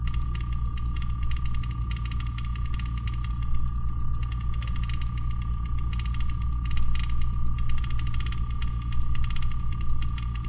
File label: Ticking Noise